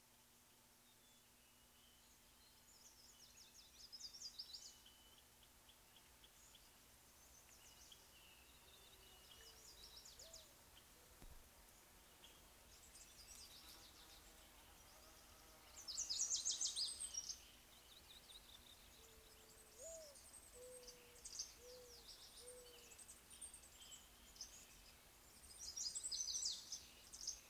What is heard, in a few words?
Real Bird Sounds; World Music; sounds of Nature; Yoga amd med music